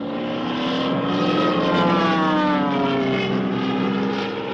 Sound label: airplane